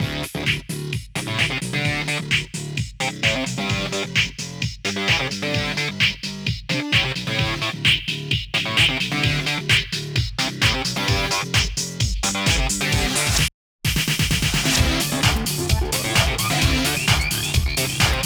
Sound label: Radio Electro; Gangstar West Coast Hustle; Android